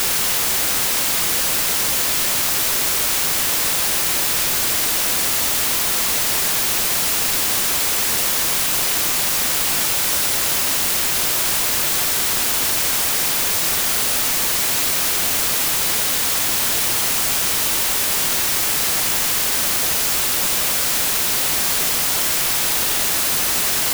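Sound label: noise white gauss left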